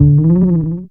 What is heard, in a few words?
BASS FX; E-Lab; X Static Goldmine 2; akai